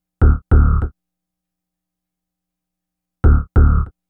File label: Bass Synth